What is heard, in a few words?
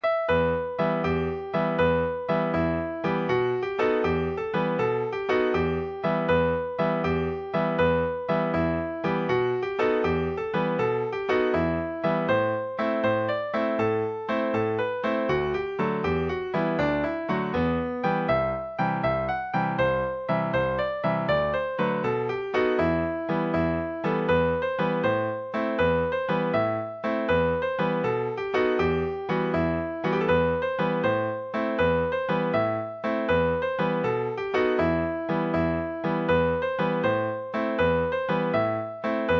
horn dance, session tune midis; abbots bromley